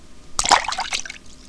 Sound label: hand splah